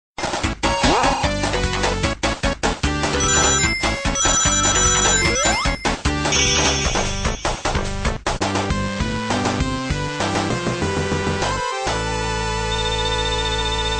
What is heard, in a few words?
Cute Sms; Sonic